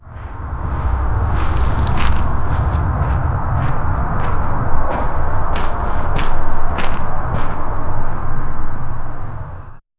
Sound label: gentle ambient wind dista, neighborhood